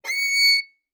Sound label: Violin arco ff sul E Db7; MIS Pitches - 2014; Strings